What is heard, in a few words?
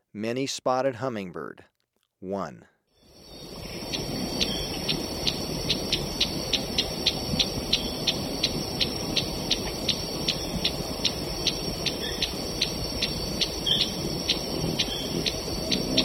Many-Spotted Hummingbird; S. America